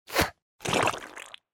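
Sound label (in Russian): выплёвывает гадость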